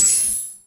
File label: buff apply